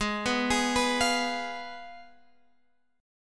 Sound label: Ab Minor 6th, Guitar, Open G; 18,16,18,16,18,x; down